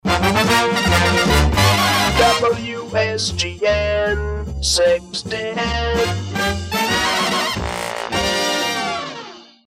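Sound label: Calliope Signature - Instrumental, jingle; WSGN; (Instrumental redo of Signature)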